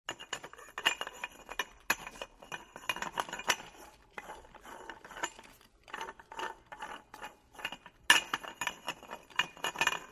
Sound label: Fire Hose Nozzle, fire truck